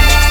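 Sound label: STACK BRASS, Ragga & Dub Reggae; RAGSTACKS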